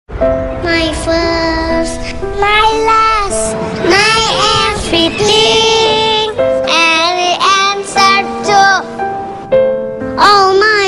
cute

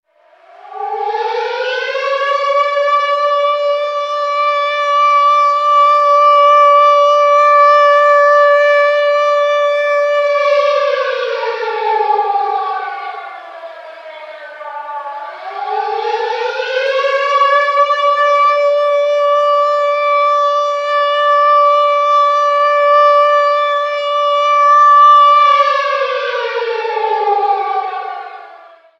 Air Raid Siren Alert

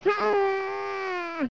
xbox 360 startup, sms; ringtones

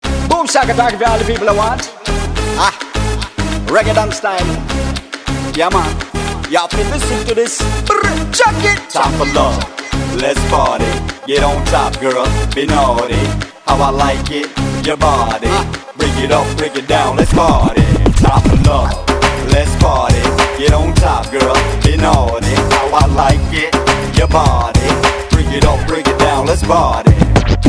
desi rap ringtone; hip hop

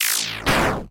Slash Hit; Undertale

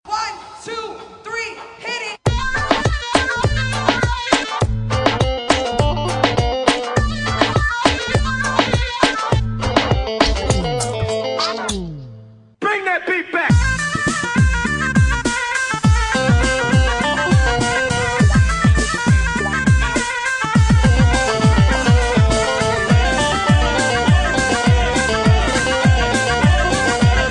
remix halay

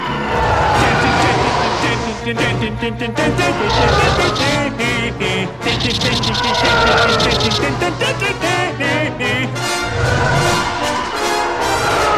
alarm; miy guy

